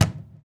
CAJON; Univers Sons - Raricussions

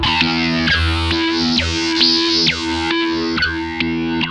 ROBO ZAP, Funk Guitar; 90 s Sample CDs; Zero G

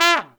TRUMPET FX, Phantom Horns; Zero-G

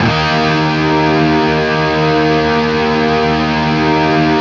5TH D# looped, Guitar; East West; Steve Stevens Guitar Samples Collection

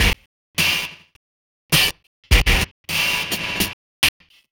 Dirt, Drums Beats

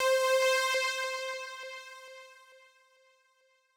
Synth C4, 135bpm; Zero-G Sound Sense Grime Scene; GS Construction Kits; The Hoodrat; 90 s Sample CDs

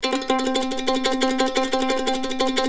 CEE TRILL C R, Blues Guitar

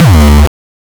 909 Dist Reso Crushed - D2, Kicks; Drums; Mbase 11 Kicks

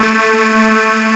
OOHH, HUMANS; M8; CMI Factory

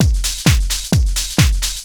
drum 130 trance

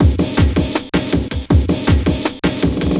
Beat, Drum N Bass

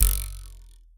Spoon, Foley; musicradar; cartoon caper

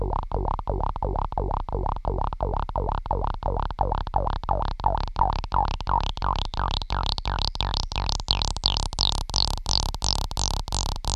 EMS SEQ; TECHNO-BOX

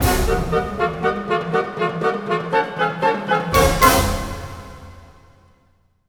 Orchestral Loops; 128 VERTIGO

